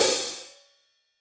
HIHAT side loose

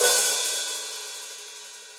Hihat Open Shank Classic Muffle; Drum Hits; Standard Hits; Ableton; Factory Packs; Session Drums Studio

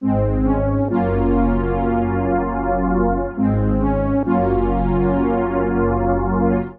Super 80's Soundtrack (Ab) 106bpm; Music Loops; Ableton; Factory Packs; Singularities

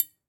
Triangle Mute; Triangle B Mute; Latin Percussion; Drums; Drum Hits; Bell; Ableton; Factory Packs

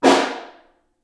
Snare Far

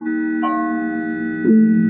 Atonal; Inspired by Nature by Dillon Bastan; Emit Presets

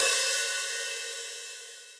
Hihat Open Shank WarmAndLow; Drum Hits; Standard Hits; Ableton; Factory Packs; Session Drums Club

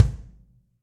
Kick Plastic Deep Snare Dynamics; Drum Hits; Ableton; Factory Packs; Session Drums Club; Standard Hits

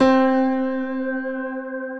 Grand Piano Arp Sweeper; Ableton; Factory Packs; Grand Piano Hybrid

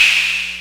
Clapbox Noise; Ableton Foundation FreePacks

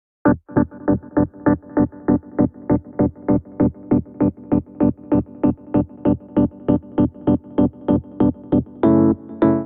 Muddy Whirl Piano E Minor 85 bpm; MIDI Clips; Accompaniment; Ableton; Chop and Swing

